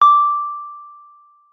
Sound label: WURLITZER, D6; Xsample VOL-1; 90 s Sample CDs; WURLITZER RS